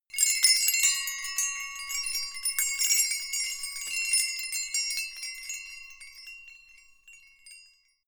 windbells ringing

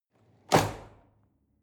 drawer cutlery open lock

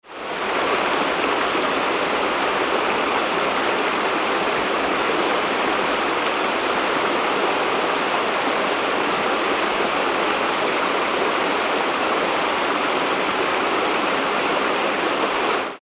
waterfall

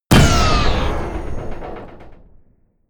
sci-fi plasma cannon explode

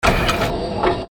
machine scream

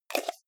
jar plastic midsize close fast

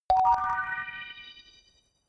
notification kalimba magic high

